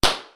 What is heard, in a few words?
Cracker; stackbounce